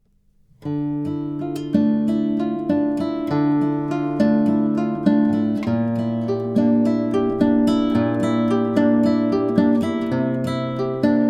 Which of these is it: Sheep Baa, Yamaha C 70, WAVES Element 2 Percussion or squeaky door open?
Yamaha C 70